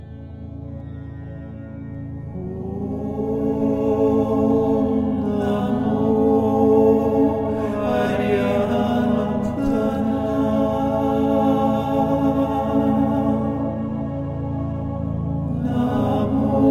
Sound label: Mahavira Namokar Mantra